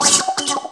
Wacky Wacka C#2 Up; Mo' Phatt; Digital Sound Factory Mo' Phatt

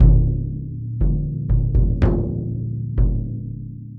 world drums, mixed drum loop, 120; Best Service ProSamples vol.52; World Instruments 2

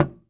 TOY KICK; AKAI S6000 CD-ROM - Volume 1

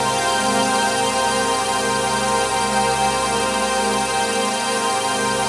TRANCPAD; Best Service; XX Large Pads; Sample CDs

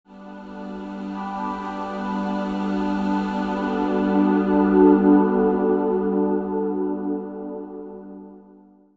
Digi Pads; Jungle Frenzy 1

